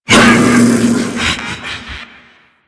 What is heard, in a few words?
Ape Prelate Attack A; Enemies; Warframe; Proto Infested